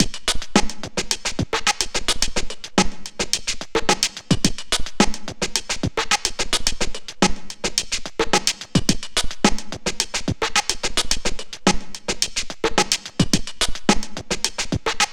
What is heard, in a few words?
Club Dance Drums, 108BPM; Pony Fan Music Remix Stems